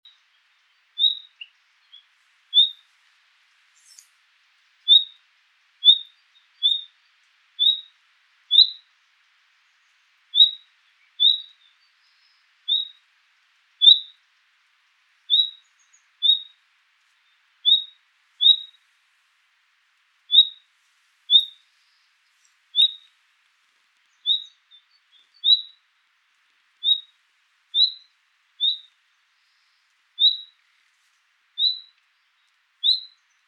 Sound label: Luscinia megarhynchos; SETE